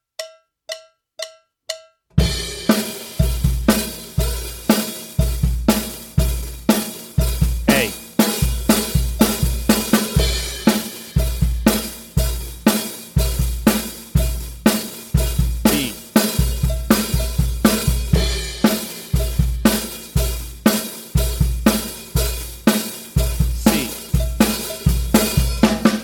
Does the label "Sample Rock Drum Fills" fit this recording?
Yes